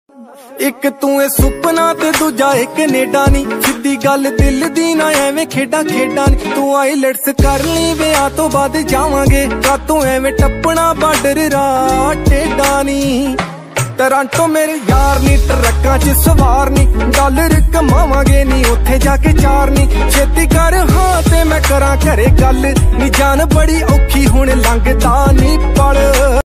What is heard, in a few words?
Trending Punjabi Ringtone